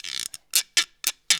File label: Roots Reggae; Sounds Good